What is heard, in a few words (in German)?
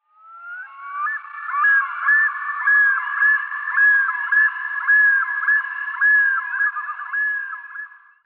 Eistaucher Gavia immer; Vogelstimmen